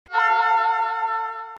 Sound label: honk; mashle